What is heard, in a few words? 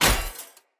Sphere Polearm Medium Impact Metal, weapon impacts; Player; Environment